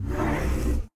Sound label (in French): monstre